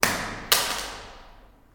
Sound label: drop cell phone; horror